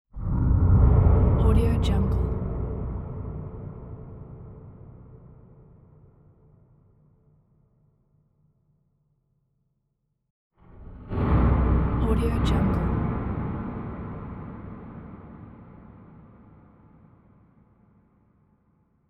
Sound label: dark atmospheric effects